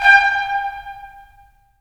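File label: brass trumpets staccato, left; Roland LA Composer vol. 4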